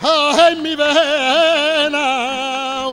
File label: FLAMENCO VOX; Best Service; World Colours